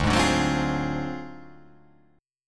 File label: D 7th Sharp 9th Flat 5th D down, chord